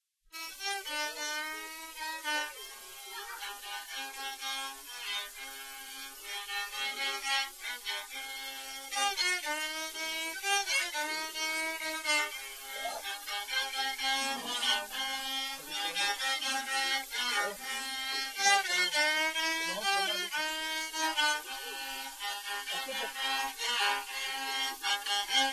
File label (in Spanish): Canticos con violin; festival luzmila patiño; fundacion patiño